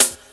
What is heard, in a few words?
Shutter Hat; Proteus 2000; Multisamples; Digital Sound Factory Proteus 2000